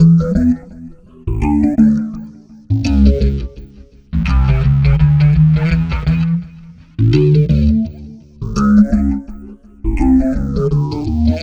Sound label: Filtered Funky Bass, Electric Bass; Sonic Foundry (Sony Creative Software); Leo Cavallo Bass Taster